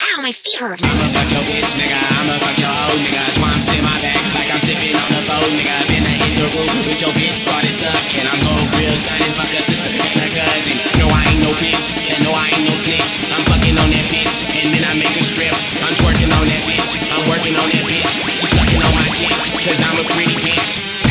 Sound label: HARDCORE